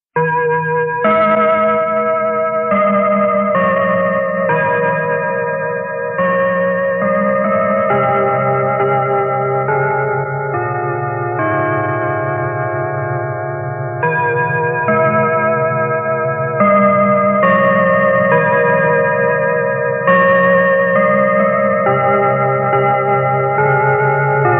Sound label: chime; denon